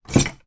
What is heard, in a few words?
household large kitchen knife remove from wooden block